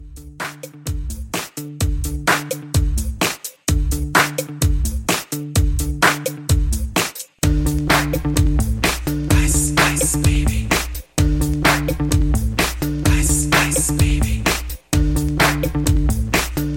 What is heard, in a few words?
Mashup Old School 128Bpm